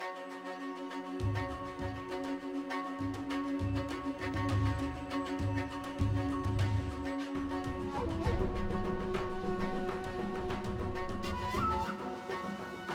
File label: mood loop tense; lol